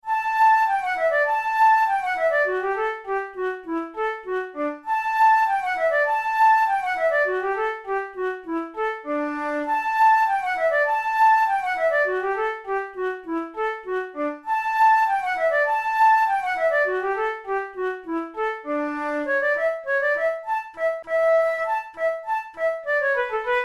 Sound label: musette in d major, flute; bach